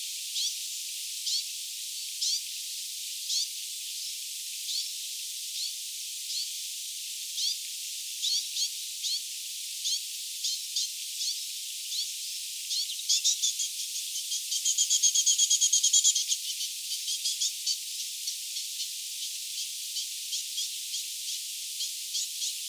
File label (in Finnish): hernekertun poikasten aantelya